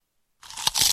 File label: zombie eating; pvz